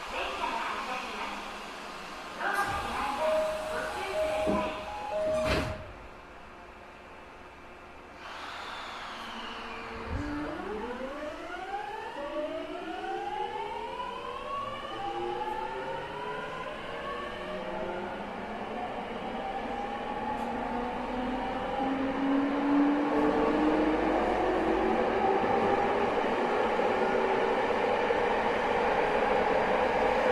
twr 70-000

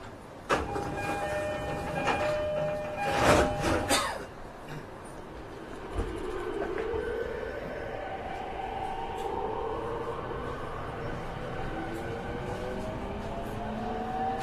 joban rapid narita E231, train

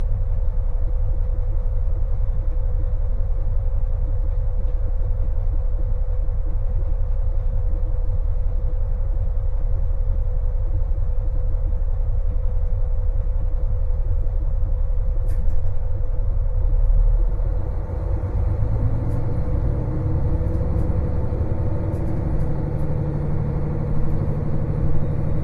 kiha40-700

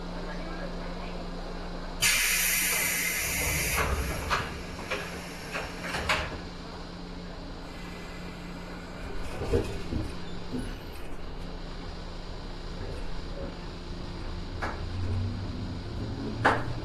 tokaido 113, train

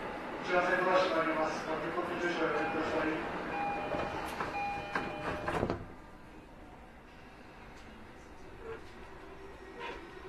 yamanote E231, train

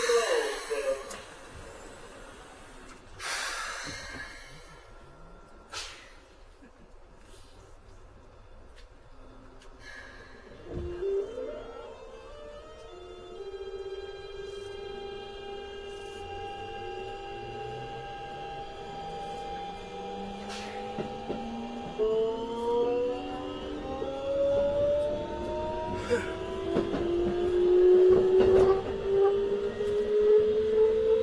keikyu 2100

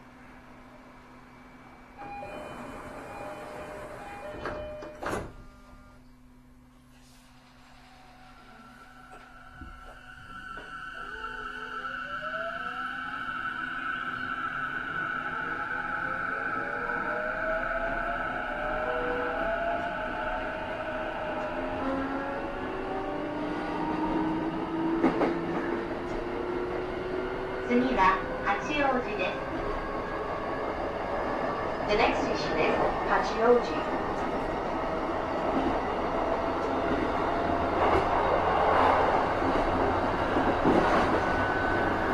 chuo rapid E233, train

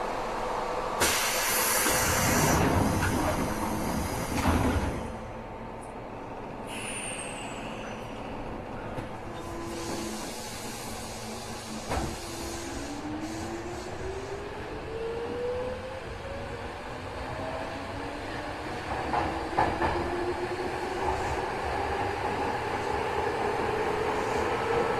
tokyo metro 5000, train

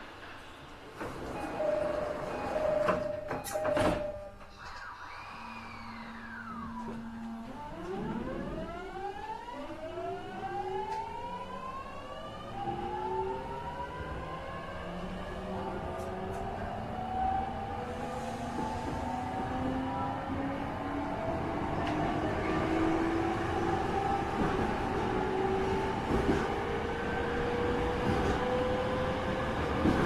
keihin tohoku negishi 209-500

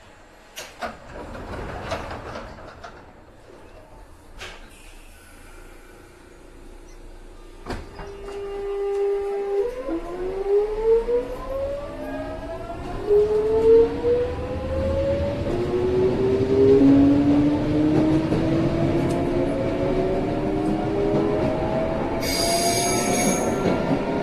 sotetsu n7000, train

